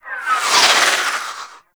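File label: Super Weapon Missile Projectile By; Warframe; RailJack; Proc Ships; Corpus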